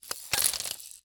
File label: Foley Rattle Spin With Squeak